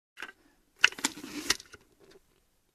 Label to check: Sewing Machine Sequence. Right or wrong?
Right